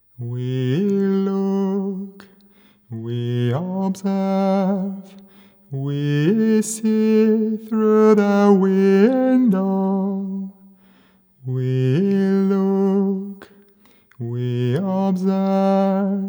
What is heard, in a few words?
home solo male, Community Opera; popera